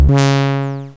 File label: start; breakout